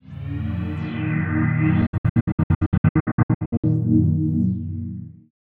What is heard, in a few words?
Stutter Pad, techno drum fx; Single Hits